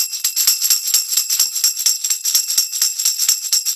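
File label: tambourine, Roots Disco; USB Soundscan vol.32; AKAI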